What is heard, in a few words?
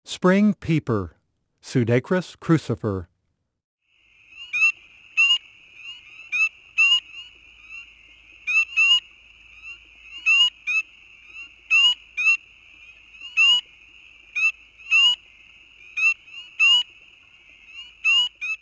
Spring peeper; amphibians; frogs and toads; frog calls